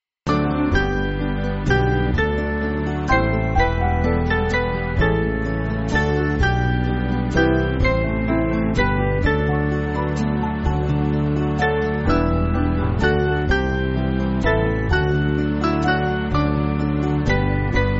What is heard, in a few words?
Band, church music; The Bridgegroom Soon; Himmelreiche